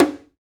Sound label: DUMBEK; Roland - LA Composer vol. 1